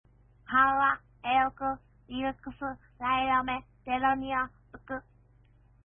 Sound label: water